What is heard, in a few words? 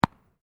SPORT BALL RUBBER BOUNCE CONCRETE; Bounces; Objects